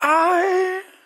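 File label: "aaaay" pain; zvuk aaaay bol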